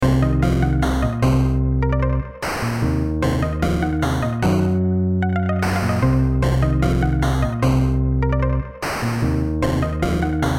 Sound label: Trap Remix; Five Nights at Impostortronics; Among Us Trap Remix Remix